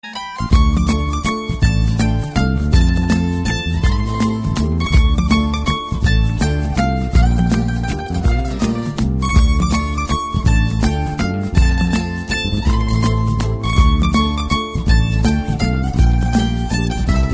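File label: Beautiful Guitar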